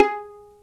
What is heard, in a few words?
PITZ STRINGS